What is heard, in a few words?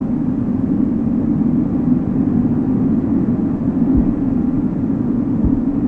high wind